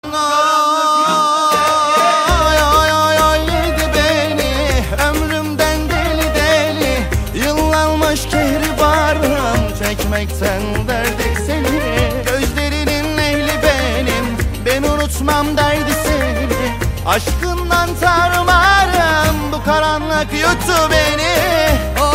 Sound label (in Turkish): Akustik